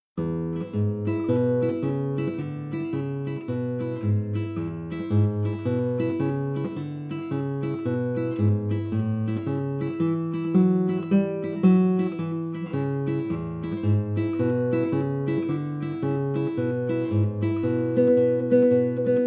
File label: rock accompaniment; Pouce Rock accompaniment